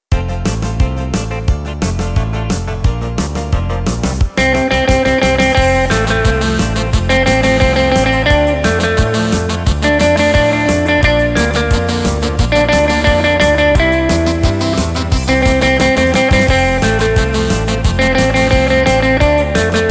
twist

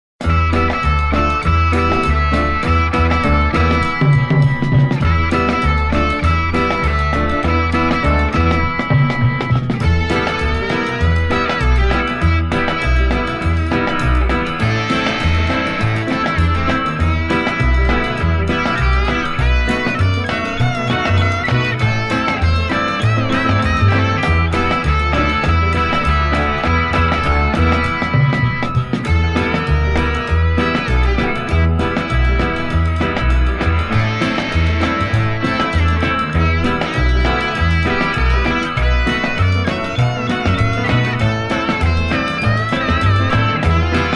steel guitar

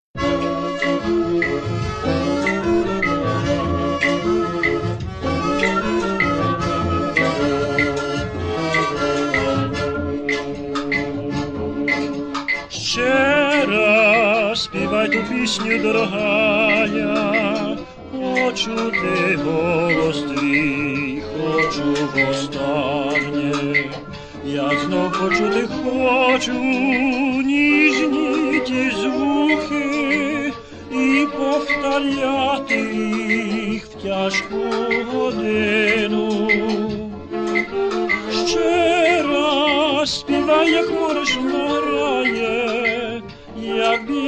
rumba

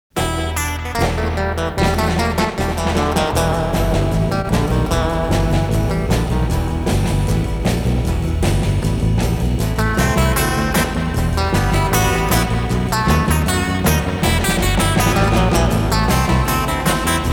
two guitars; Nippon Phonogram Co., Ltd.; ФИЛИПС СТЕРЕО FDX-99

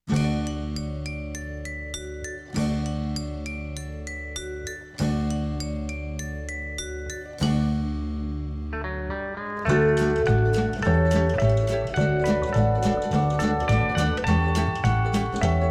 maori chimes, hawaiian music

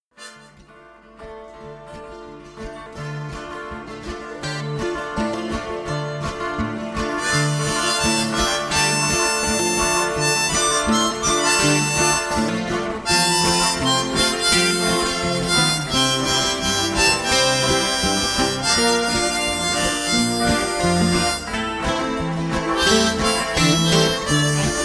instrumental

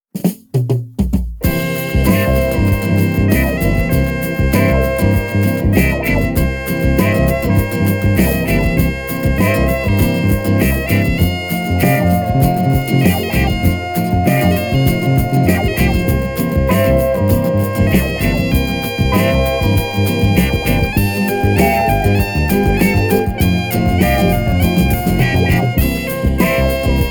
moog rock

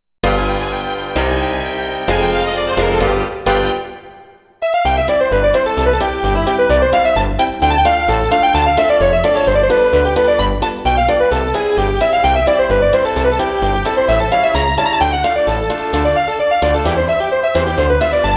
polka

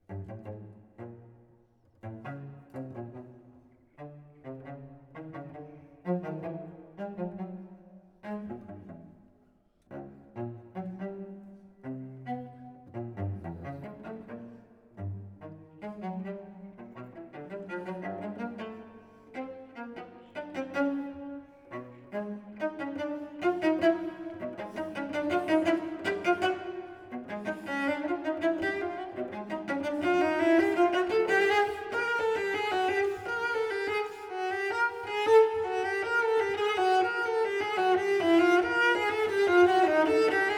violoncello solo staccato legato

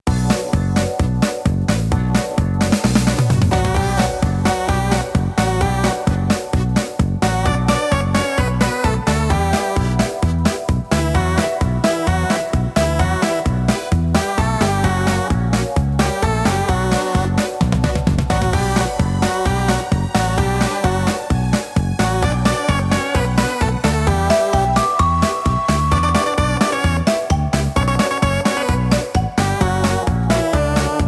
instrumental

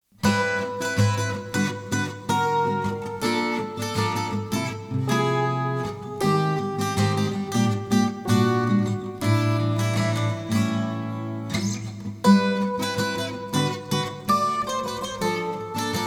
osennee instrumental

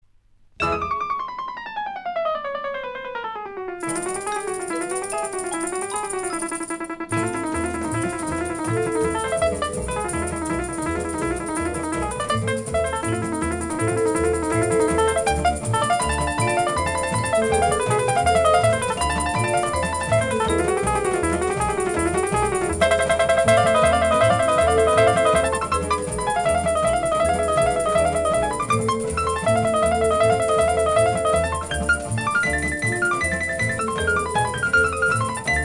orchestre, samba

